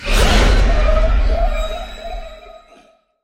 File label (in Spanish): cuerpo levanta